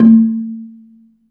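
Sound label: Balafon A2, Asian Traditions; Soundscan vol.60; AKAI; BELAFON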